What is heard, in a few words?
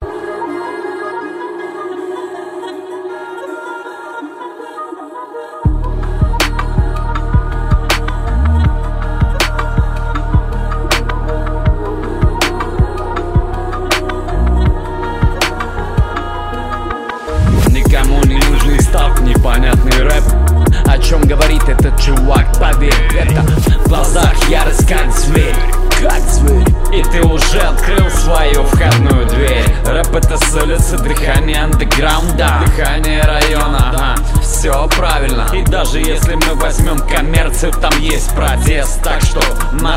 HIP HOP